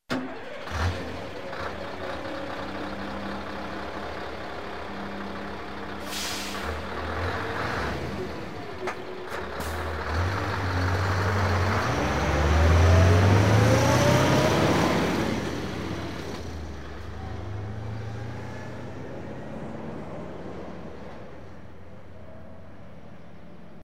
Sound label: scania v8